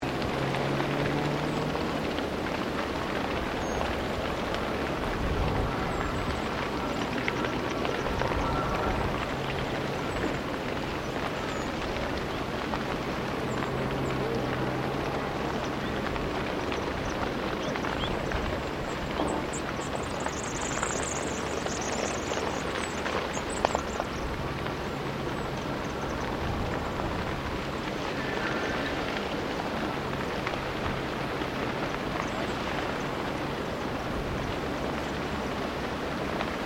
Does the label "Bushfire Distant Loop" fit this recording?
Yes